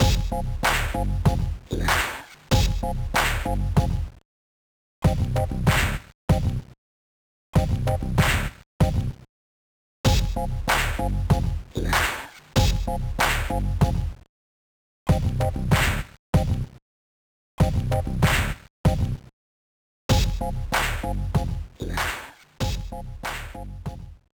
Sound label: BGM; GBA